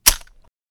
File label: Inf Weapons Foley; Red Orchestra 41-45